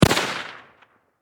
Warfare Rifle .30 Caliber M1 Carbine Semi Single Shot Distant Perspective